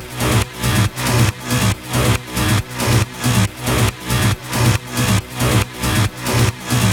Special Sounds Root B 138 BPM; vengeance essential club sounds-3

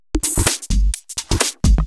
Houseloops 128BPM; Vengeance Samples

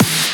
Snares; Vengeance Samples; VEH2

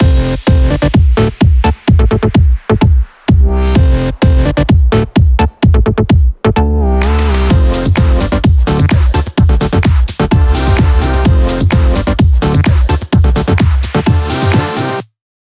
128BPM Mix; Vengeance Samples; VFH1 128BPM The Simple Kit

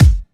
Kicks; Vengeance Samples

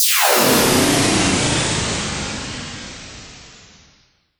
FX Sweep Down; vengeance essential club sounds-3

